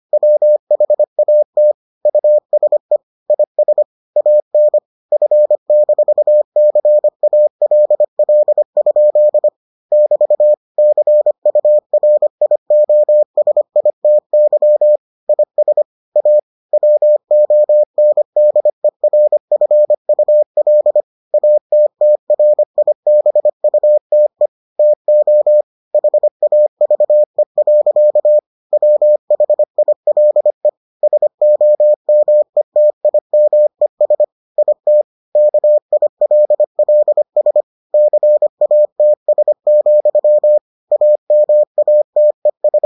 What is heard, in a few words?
morse